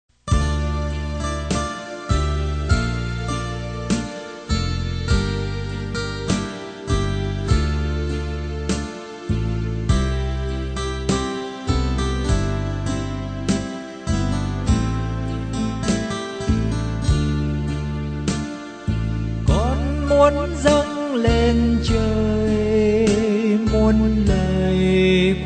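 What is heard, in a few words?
soprano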